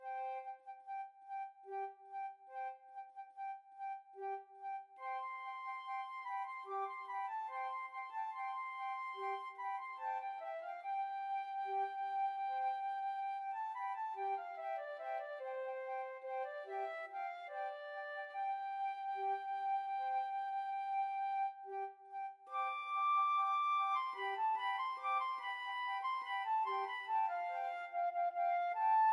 ravel bolero FL

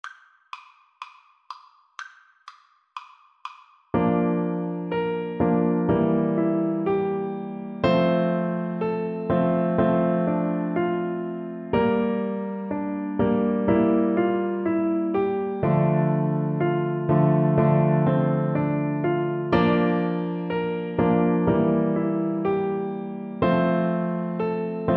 Clarinet

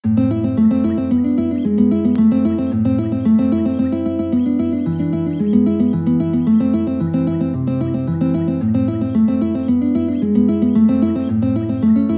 guitar

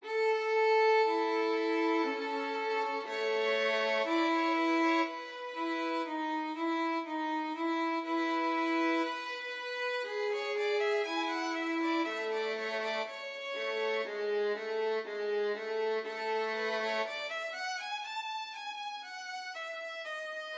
Mozart violin duet

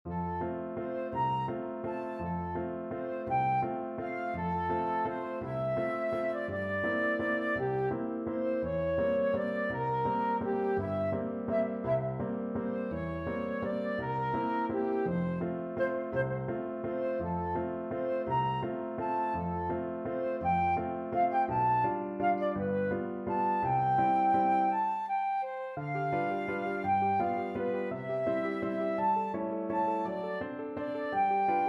rosen aus den suden, flute

